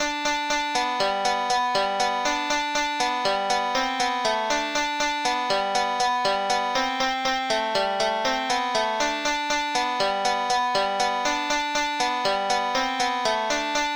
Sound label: 120 bpm; Drops of Brandy